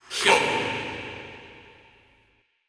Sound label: Processed Battle Shouts, Viking Vocal